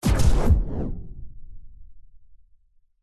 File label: science fiction weapon incoming bullets hit impact whoosh; zapsplat